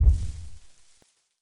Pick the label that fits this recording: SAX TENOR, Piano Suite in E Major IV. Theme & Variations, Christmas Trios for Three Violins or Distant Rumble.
Distant Rumble